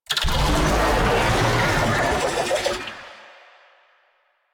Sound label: Infested Oni Vocal Idle; Warframe